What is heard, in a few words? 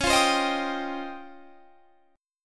C sharp Major Sharp 11th; C#M#11; strummed